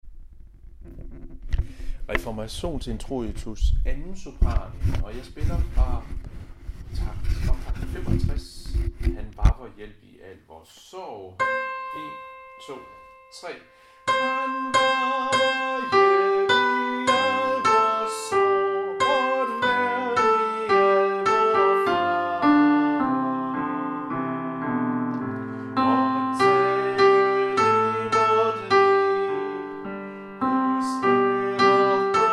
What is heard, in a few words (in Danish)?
sopran; Reformationsintroitus; harlev koret